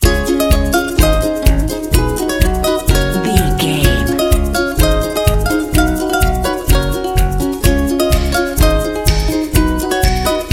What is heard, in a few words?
tropical fun